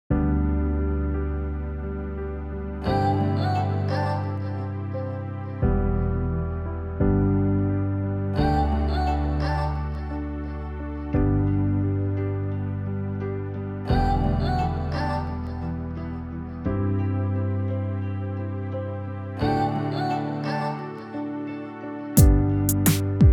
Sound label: Karaoke, 87 BPM; BasserMusic Studios